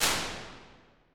Cello Room, impulse responses, small spaces, rooms; Apple